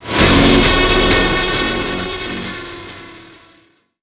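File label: sting, guitar cymbal; transformers war for cybertron gamerip; RESCUE ZETA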